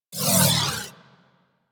Crewship Turret Proj By, Corpus

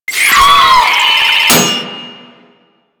Volatile Runner Explosion Warning; Enemies; Abilities; Proto Infested Volatile Runner; Warframe

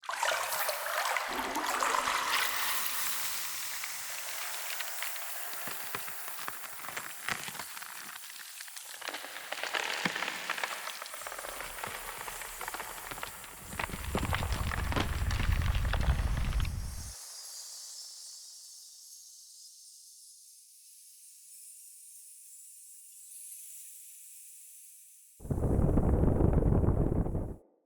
Tree Growth Tree; Cinematics; Bromius

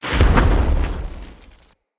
Fire Blade Projectile Impact; Characters; Enemy; Abilities; Sinecure; Banneret

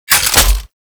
Shotgun Mech; Veilbreaker; Kahl

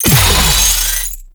Blast Charge; Warframe; Captain Vor; Abilities Redux

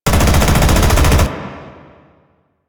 shot fire loop; Rusalka; Warframe; NineteenNinetyNine; Stage8ShitboxConvoy

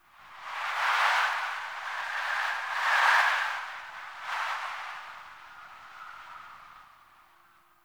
Wind Gust; Ambience; Warframe; Eidolon Plains

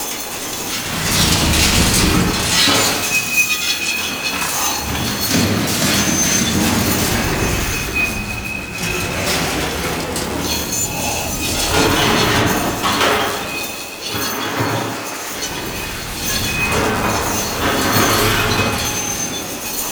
Mech Moving On Rails Loop; Ambience; Props; Warframe; Entrati

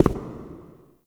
Shadow Figure Steps

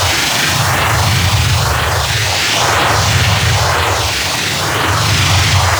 Charging Rush Loop; Warframe; Infested Lich; Hard Drive

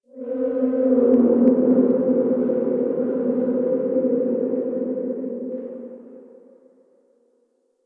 Eerie Drone; Warframe; Nineteen Ninety Nine

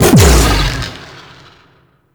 Bite Cast Burst; Warframe; Voruna

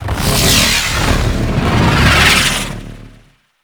Ninja Storm; Warframe

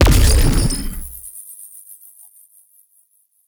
Void Angel Portal Beam Fire; Abilities; Warframe; Zariman

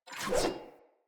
Player Sphere Polearm Whoosh Fill Short; Weapons

